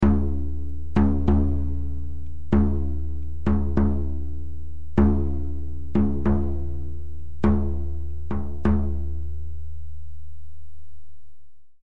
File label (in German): schamanentrommel